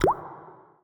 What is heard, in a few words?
drip ink